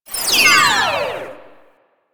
Conduit Proj By; Sentient

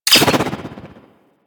Axe Hover Ignite; Sundial

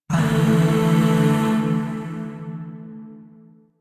Shawzin Pent Maj Chord E; Music; Chords; Warframe; Zariman Void Shawzin

